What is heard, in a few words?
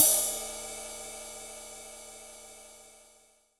reverb crash single shot d sharp key, Royality free crash cymbal sample tuned to the D# note; drum sample